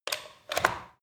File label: power socket plug